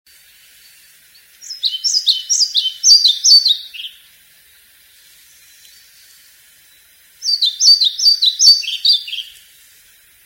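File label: muholovka pestrushka golos, pied flycatcher voice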